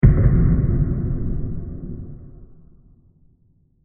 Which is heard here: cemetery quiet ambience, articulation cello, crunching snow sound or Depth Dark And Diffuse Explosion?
Depth Dark And Diffuse Explosion